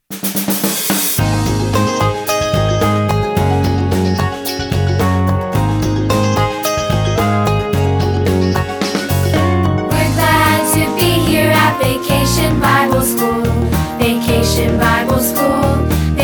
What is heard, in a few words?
band